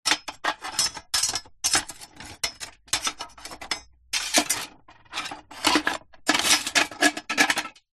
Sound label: industrial tools metal bucket rummage; zapsplat